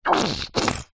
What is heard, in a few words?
food chocolate sauce bottle squeeze squirt; zapsplat